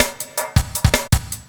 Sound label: LOOP SD, Drum & Bass, 160 BPM; Ueberschall; Drum & Bass Flash Trackz